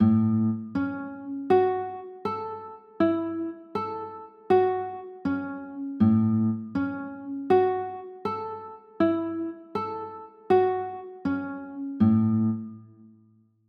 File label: a 6, chords